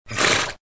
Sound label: Horse Snort